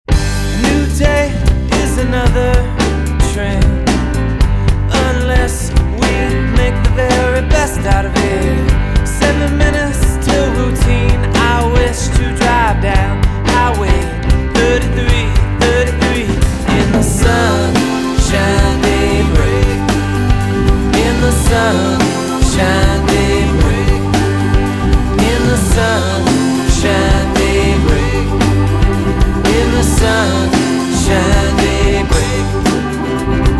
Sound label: Pop Rock